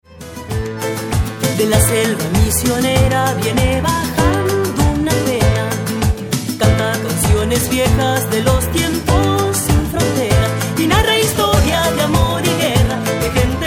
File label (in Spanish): cumbia negra litoralena